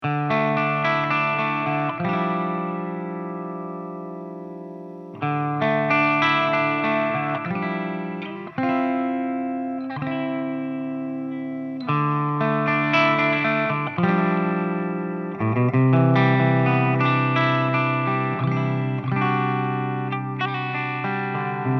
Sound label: Bridge Clean